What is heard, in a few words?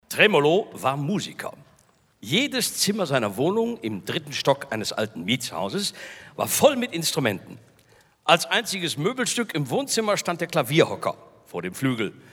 Tremolo